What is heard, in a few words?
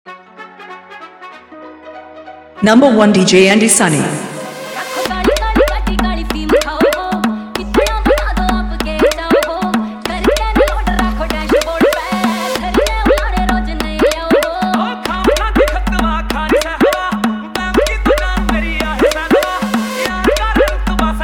Edm Remix